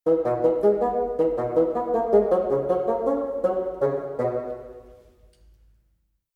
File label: SN art